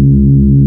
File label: bass